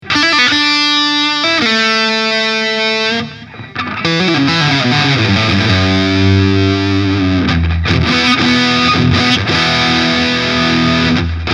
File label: Custom 22 Steg Steg verzerrt; PRS S2